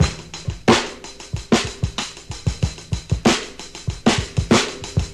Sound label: 93 bpm drum groove A key; drum loop